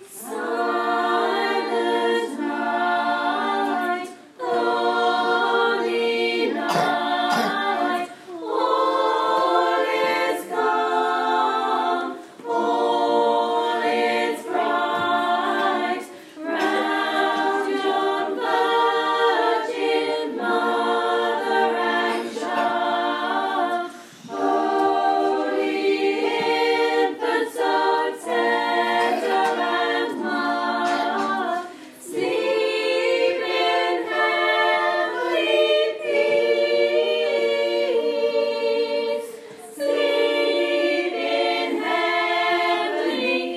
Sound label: Staff Choir